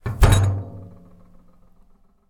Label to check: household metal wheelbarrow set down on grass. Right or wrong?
Right